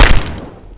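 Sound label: pop explosion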